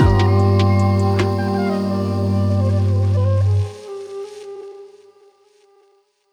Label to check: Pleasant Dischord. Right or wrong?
Right